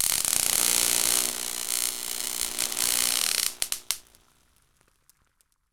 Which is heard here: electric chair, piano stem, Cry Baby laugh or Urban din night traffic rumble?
electric chair